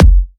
Dubstep Kicks

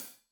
Hat Loose, Hi-Hat, Cymbals Stereo

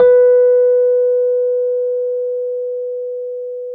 RHODES EP2 B3; Sonic Reality; Vintage Timetraveler

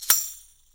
TAMSHA, EFFECT CYMB, 3D Drums; 3D Sound

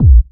126 DRM, Disco House

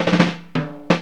FILL 88, Funky Elements LOOPS; Zero-G

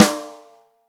ROCK SNARE CHROMATIC, Real Drum Kits

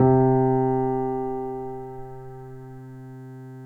PNO PP; Synclavier Sampler Library

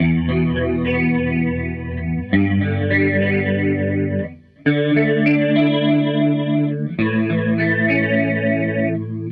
Strat Guitar Loop

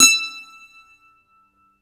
MANDOLIN; E-MU Producer Series Vol. 7 – Old World Instruments

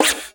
SCRATCH; East West - Global Sounds

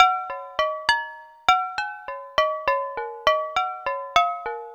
Struck Glass Melody

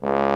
VIBRATO TROM

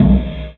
Loop, Chamberlin Rhythmate

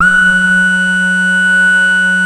BEGIN LOOP, Synth and Organ; InVision Interactive; Keith Emerson; The Most Dangerous Synth and Organ

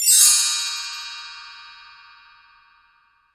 Bell Tree Down; Digital Sound Factory Virtuoso

